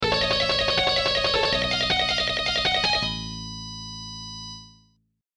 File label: lick